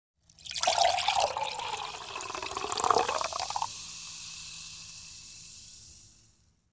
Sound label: Soda Pouring Into Empty Glass w Fizz, Household